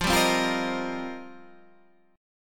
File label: F 11th Suspended 2nd; 112:1,2,(5),b7,(9),11